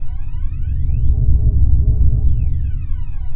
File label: External Jet